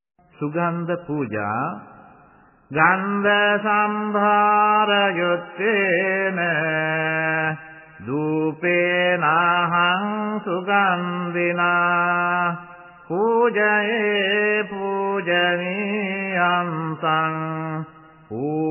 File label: chant, Buddhist Chanting